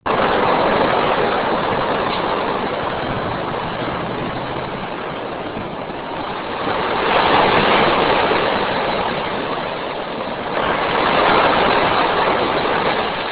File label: nj surf